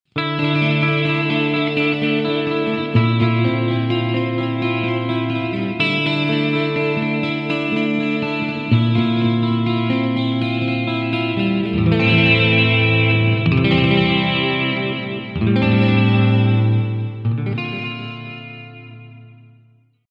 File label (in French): delay